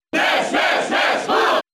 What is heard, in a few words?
Cheer NTSC; Ness; Brawl